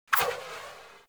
Quick Draw Tail; weapons; Warframe; The New War; Omicrus

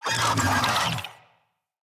Infested Big Ashen Vocal Attack Death

